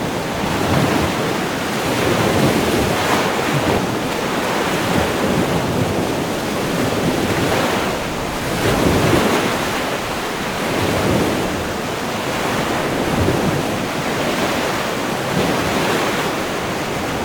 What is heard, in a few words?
Ship Move; DCS